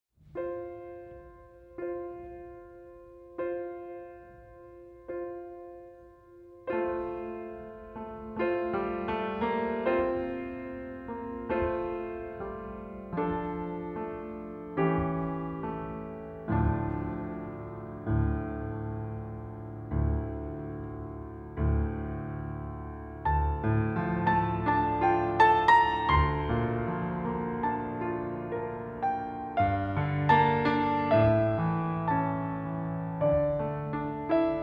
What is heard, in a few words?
Ukrainian National Anthem